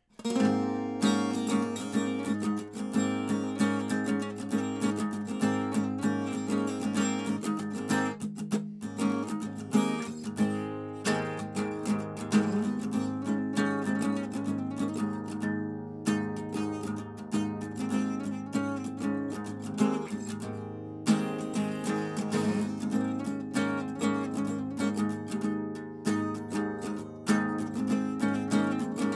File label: AC GTR LO; 97 Percent Fire Studio